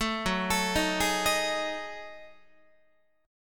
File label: Gb Minor 6th Add 9th Gb down Guitar; arpeggiated; JGuitar